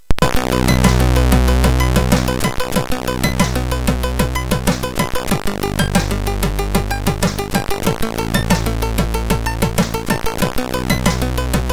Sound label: sid MOS6581R4, GAMES; Dark Caves